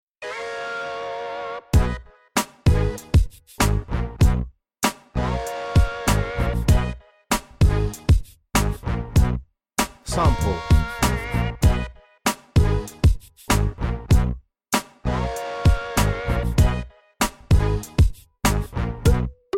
beat

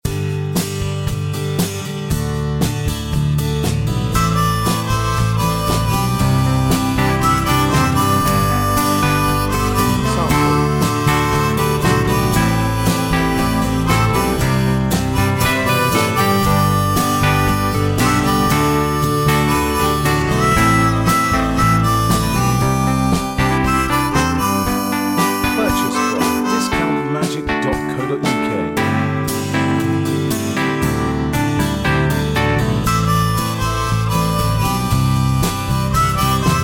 acoustic rock

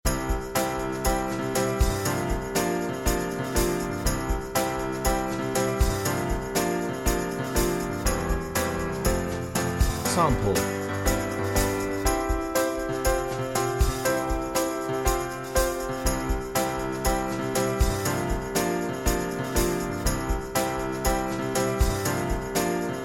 beat

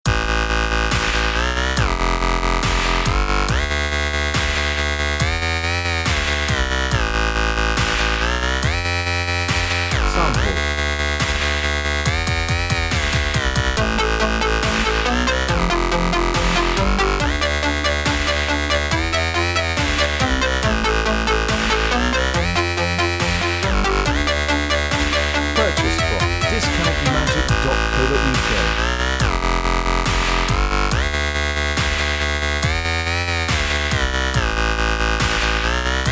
dubstep, backing

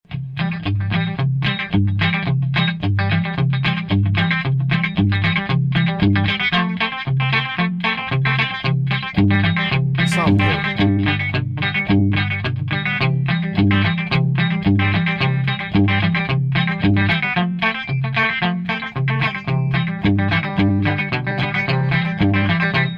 beat

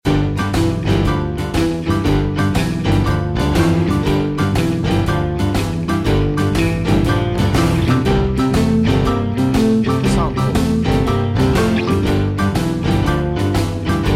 blues piano remix

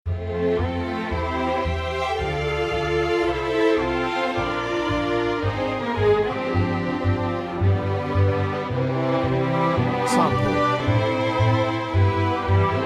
classical

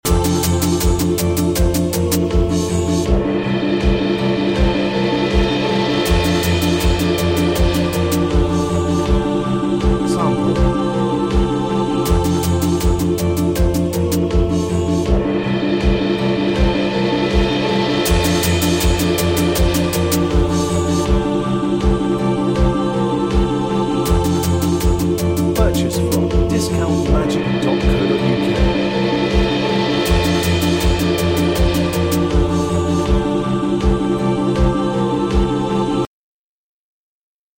harpsi choir

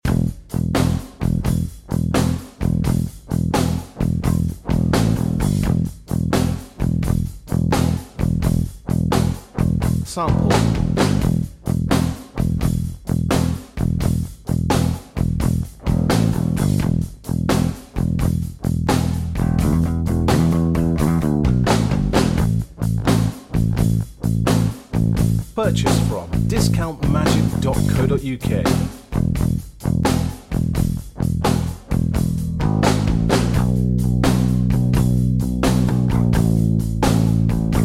blues

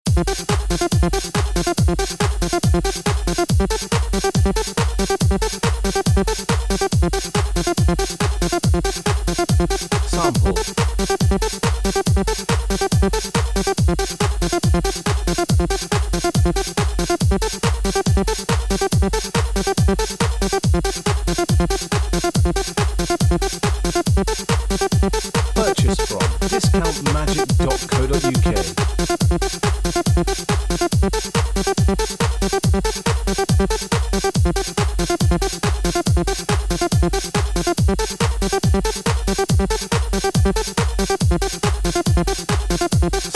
techno, backing

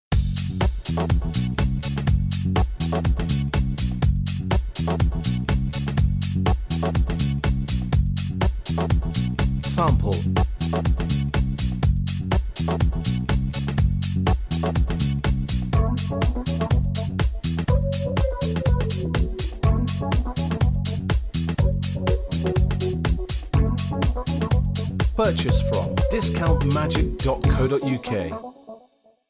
corporate

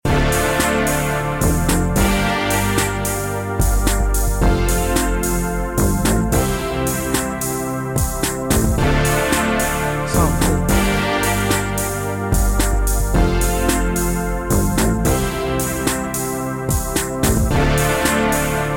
synth soul

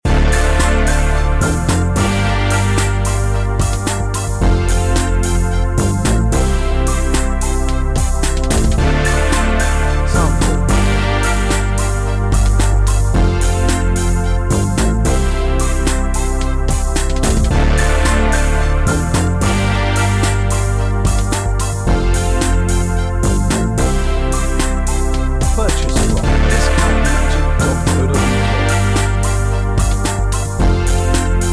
remix synth soul remix

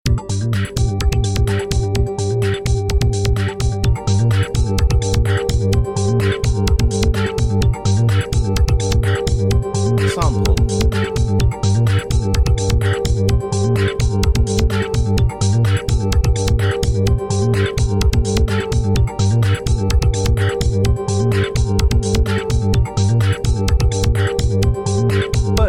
electro, backing

